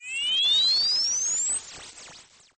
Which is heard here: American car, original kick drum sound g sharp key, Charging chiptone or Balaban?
Charging chiptone